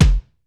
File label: ELEC.DRUMS; Crypton - DrumBase5000